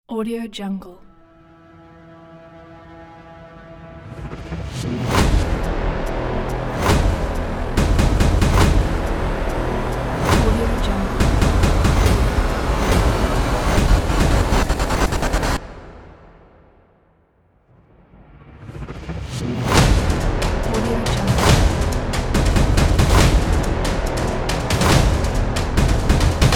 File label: trailer teaser war drums